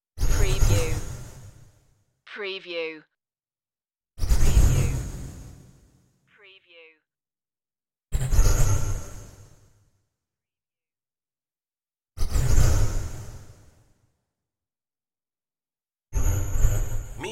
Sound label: space time anomaly